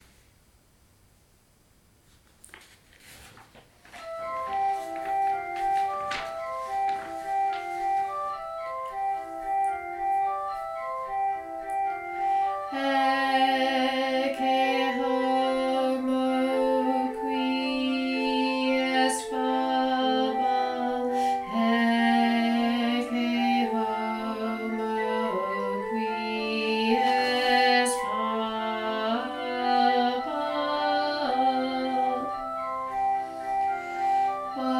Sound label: Tenor